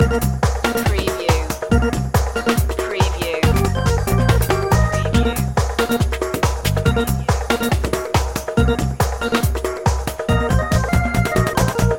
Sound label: leslie jumping loop